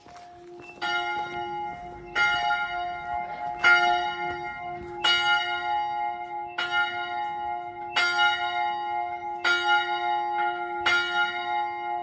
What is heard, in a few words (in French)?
SONNERIE CLOCHE AVANT BENEDICTION; LUSSERAY EGLISE; BENEDICTION CHEMIN CROIX